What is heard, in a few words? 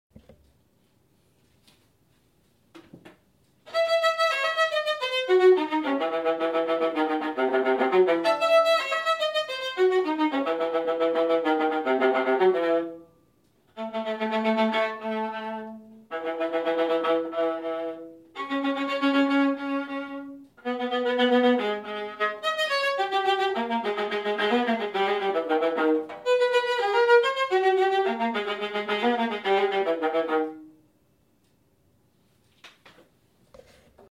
Viola; Tchaikovsky